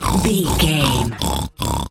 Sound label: pig sniff deep